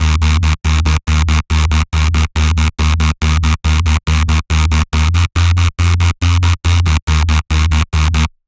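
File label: dubstep bass sound scratch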